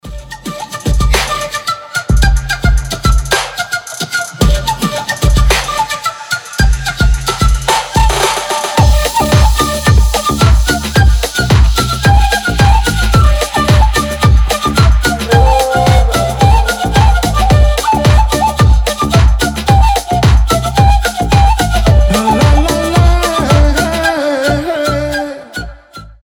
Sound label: flute, этнические